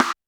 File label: Snare, Roland TD-20